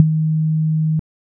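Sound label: Vibration dash; Motorola E398 Asia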